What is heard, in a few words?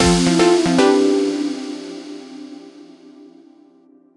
Mars Jingle Questcomplete